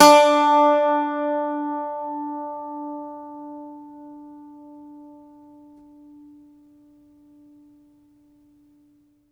IRISH BAZOUKI, Plucked Strings

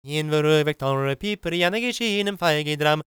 GAELIC, World Voices; ILIO; Vocal Planet

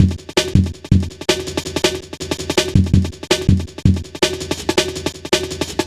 Funki, Jungle, Drum Loops 163.5

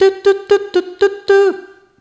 Rhythmic G

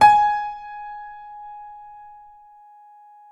PNO FF; Synclavier Sampler Library

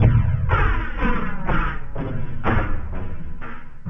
Elektro Brood, Drums; Sonic Foundry (Sony Creative Software); Friendly Fire

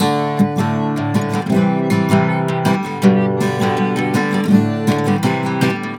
GTR6, Celtic Flavours; USB Soundscan vol.42; AKAI

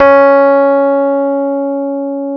C#3, Electric Pianos; Wizoo

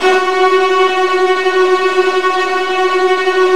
Virtuoso Strings TRM F#2 L; ILIO; Kirk Hunter Virtuoso Strings VOL-2

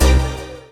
Dance Mega Synth; Best Service; 90 s Sample CDs

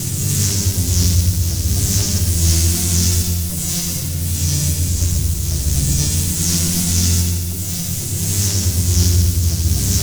Synth, Modular Electronica; Sonic Foundry (Sony Creative Software); TSS Autopilot

